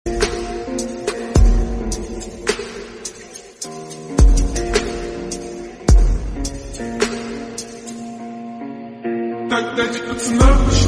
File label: lofi remix english song